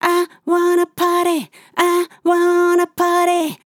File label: LYRICS Fills 120bpm Fm; i wanna party